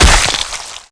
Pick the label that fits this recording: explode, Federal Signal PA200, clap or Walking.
explode